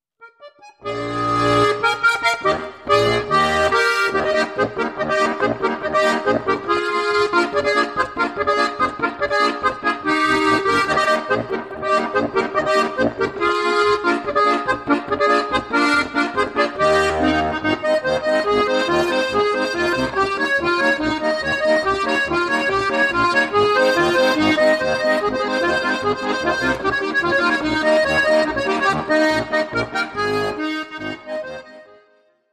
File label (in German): trompetenecho